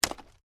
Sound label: WOOD PLANK BREAK